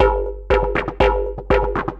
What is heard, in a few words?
Resonated 808, Drum Loops